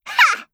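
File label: attack, Voice Line, Korean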